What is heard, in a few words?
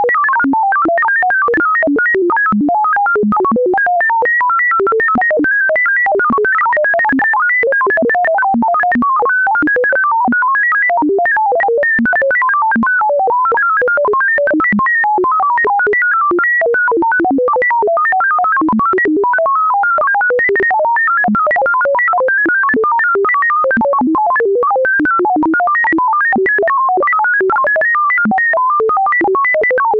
Random Glitch Tones